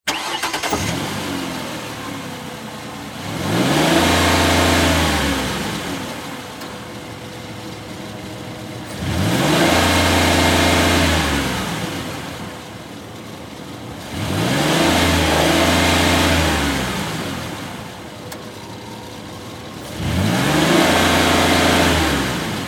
Ford Explorer, Suv, Start, Up, Rev, Engine, Shut, Off; Transportation